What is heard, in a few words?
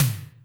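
Snare Wet Electric, Alesis D4 drum machine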